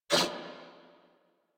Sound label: Pain, Female; en; Warframe; New War; Narmer Grineer